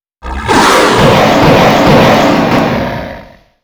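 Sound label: Dragon Attack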